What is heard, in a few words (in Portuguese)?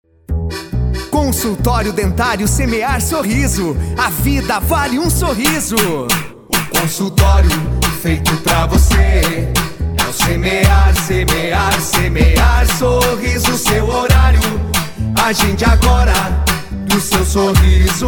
jingle comercial, odontologia; semear sorriso